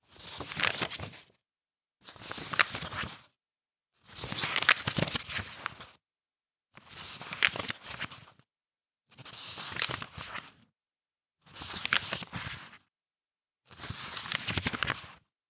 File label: Turning Pages